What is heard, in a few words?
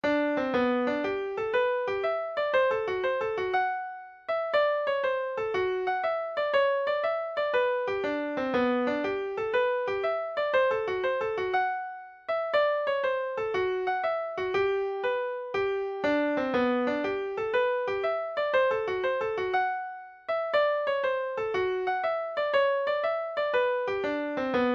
hornpipe; acrobats